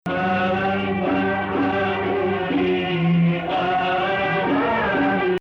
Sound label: Hijaz